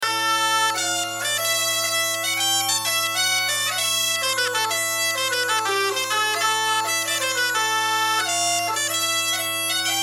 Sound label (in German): DUDELSACK UND SCHALMEI DUO